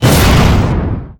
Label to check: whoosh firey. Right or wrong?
Right